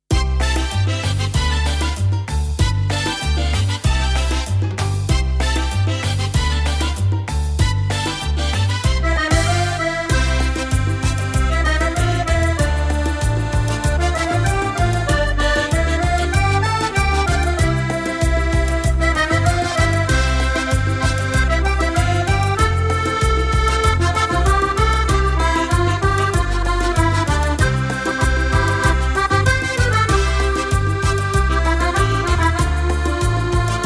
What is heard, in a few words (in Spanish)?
cumbia